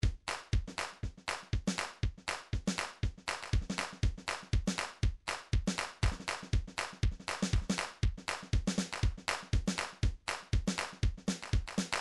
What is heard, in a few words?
midi vol 4 drums percussion